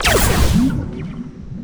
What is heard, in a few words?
grapple fire, weapons; Quake 4 Alpha; id software